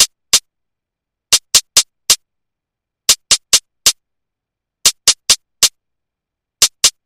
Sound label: Ambient House Hats 136BPM